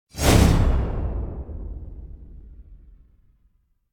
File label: Impact Whoosh High Metal Heavy Abstract Sword Strike; Hollywood Edge; sound designer's toolkit v2